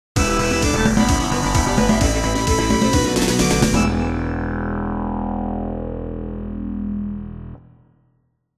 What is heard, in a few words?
Level Clear; SOR